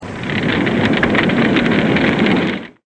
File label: Fire